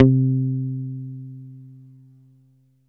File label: BASS C4; East West - Phat & Phunky